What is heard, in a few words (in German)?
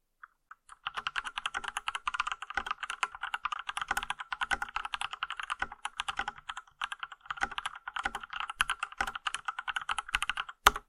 weiss tippen